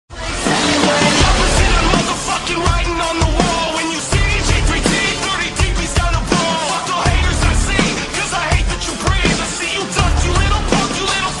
iphone 7 rock ringtone

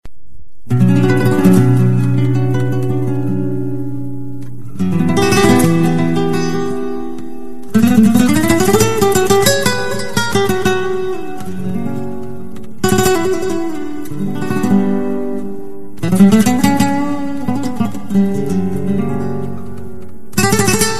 i phone 8 plus guitar solo ringtone

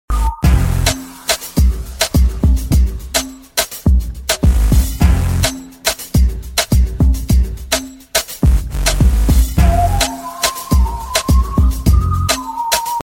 huawei mate 10 original ringtone

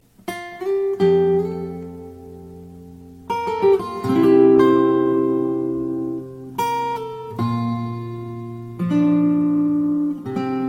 guitar iphone 6s ringtone

